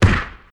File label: Hit